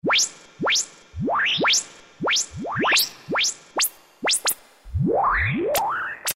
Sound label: zappu; Latin And F.X. Percussions; Roland SN-U110-02